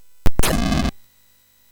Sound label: Shuffle, MOS6581R3